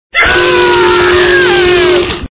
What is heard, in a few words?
scream; courage